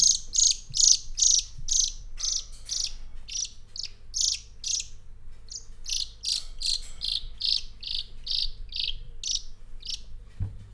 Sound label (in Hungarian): lassan elhalkuló, zöldike; jaszberenyi zoo 2015